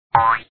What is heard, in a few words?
hit Good; the independent